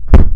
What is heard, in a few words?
Fireworks; QB64